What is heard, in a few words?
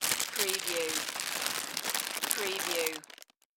Cereal Bag Close